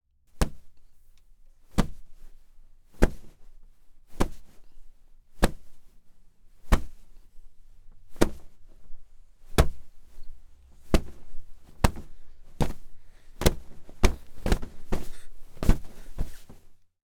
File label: Pillow Body Hit Multiple